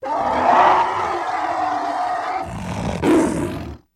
Elephant Tiger Roar

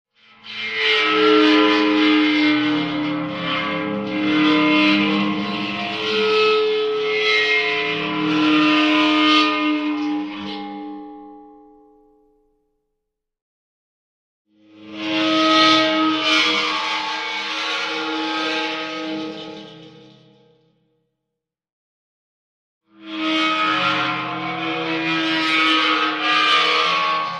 Dry Ice Eerie Double

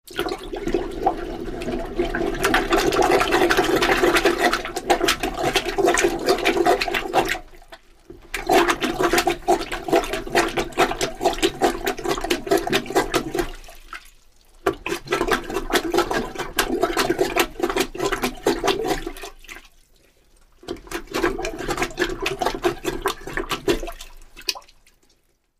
Toilet Plunging

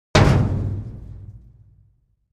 Dumpster Type 2 Hit with Sledge Hammer

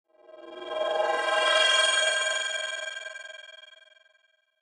Chopper Digital, chopper

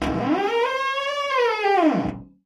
screech, squeaks

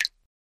Drip Sml After Drip Hi

